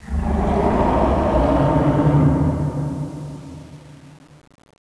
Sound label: garg roar prechase